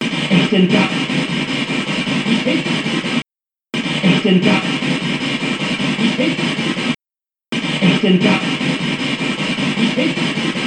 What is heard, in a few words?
SpiritBox